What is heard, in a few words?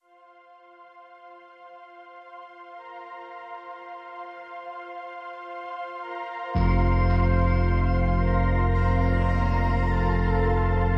Synth Stem